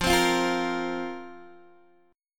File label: F Suspended 4th F, Guitar Open D